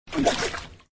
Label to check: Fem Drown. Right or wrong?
Right